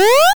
8 Bit Jump

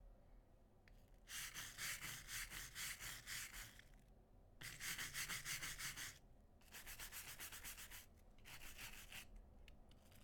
Brush Scrubbing

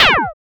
Laser

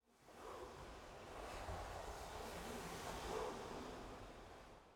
Wave Wind

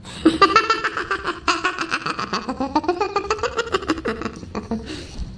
Bush laugh

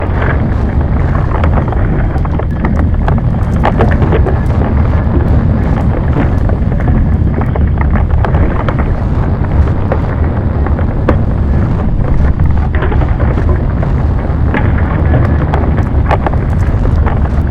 Earthquakes

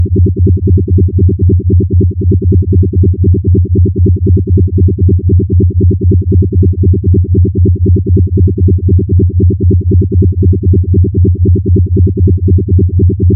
Rave

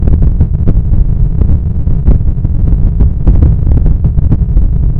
Static

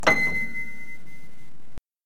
Piano

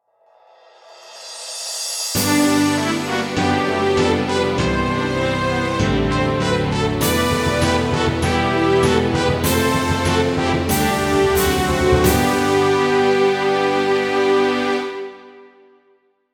Epic Stage Clear

